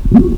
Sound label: Noise; inner demons